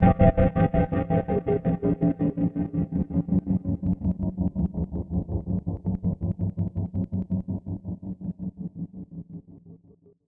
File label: SPACE FX 140; SpaceSounds